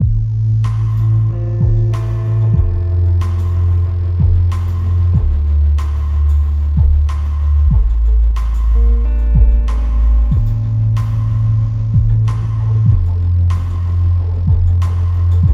Loading Screen Loop

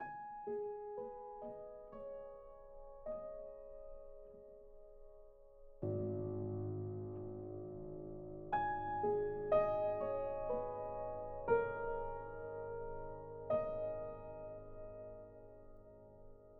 piano theme

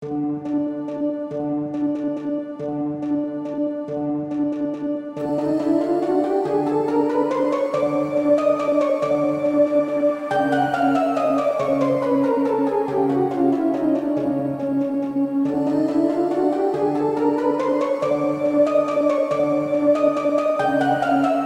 dark carnival

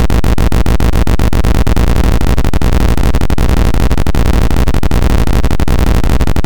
weird helicopter sound

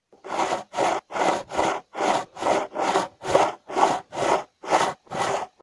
saw; starninjas

